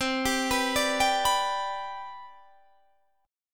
C Major 7th Suspended 2nd C down Guitar Standard, chord; CM7sus2 arpeggiated; JGuitar